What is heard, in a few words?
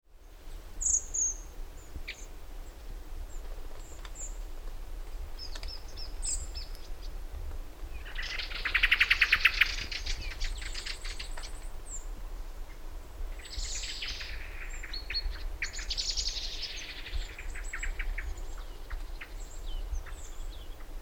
Turdus merula; SETE